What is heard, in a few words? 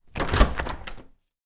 household door open pvc conservatory